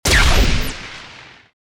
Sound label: gauss fire; STALKER